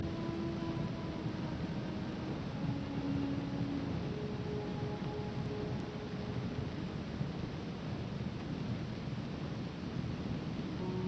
Piano Concerto No 1 in E flat major II Quasi adagio Allegretto vivace; Allegro animato